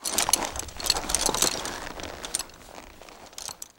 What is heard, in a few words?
Animal Horse Saddle Movement; animals; AdobeCC